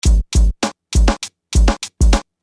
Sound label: Beat, Hip-Hop Street Beats